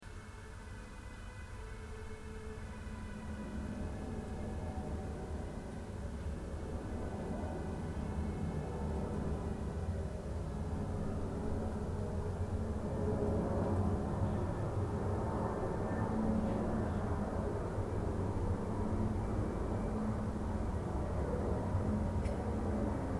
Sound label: chainsaw